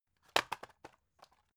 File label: drug package drop deep hard; berufe; arzt